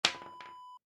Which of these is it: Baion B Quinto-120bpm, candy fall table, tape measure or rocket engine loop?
candy fall table